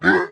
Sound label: pain falling short, elite involuntary; covy involuntary